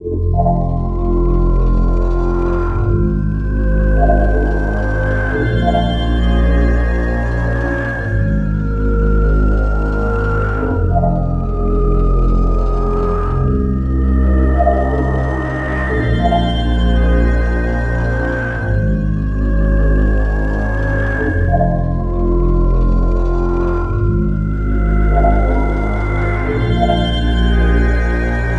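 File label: ravine ambience loop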